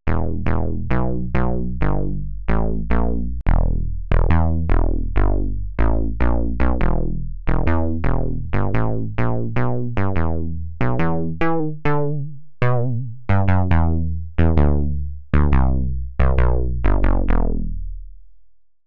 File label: modular; vcv